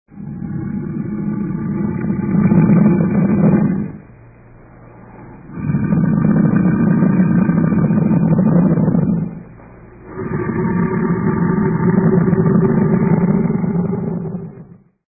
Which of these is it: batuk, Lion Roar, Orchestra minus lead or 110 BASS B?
Lion Roar